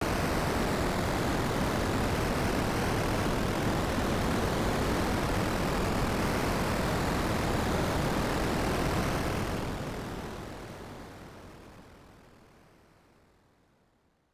Grinding Ambience; snes; metal max returns

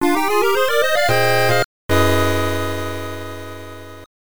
Area Clear; bonk s adventure turbografx 16

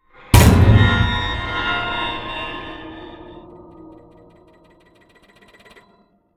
Stingers; Outlast 2; PS4 Windows Xbox One

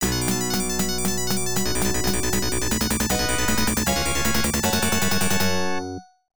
Unused Jingle; super x; arcade

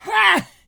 voice male attack; Infernal Radiation

